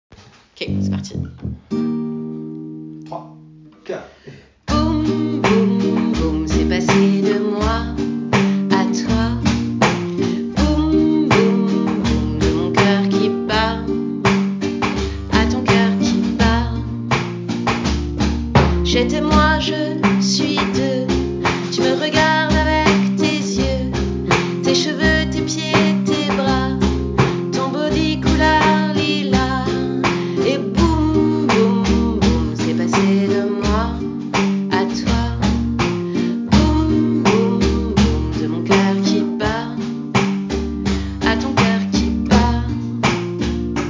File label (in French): boum boum boum chanson 3 petits pas